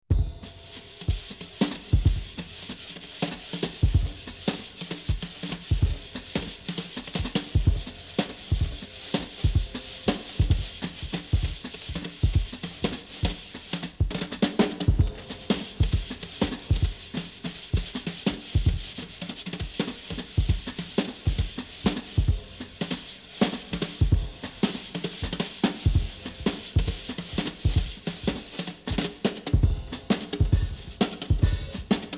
solo percussion waltz

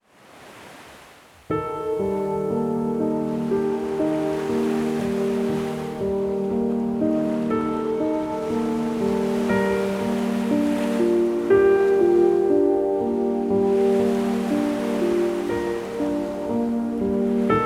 piano, beach waves

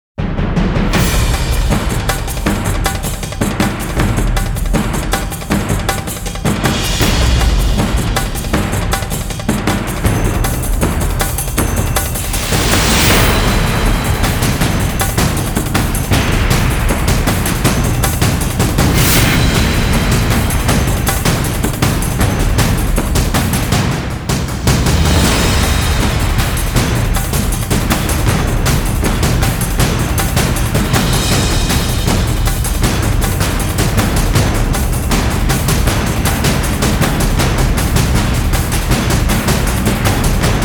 drums

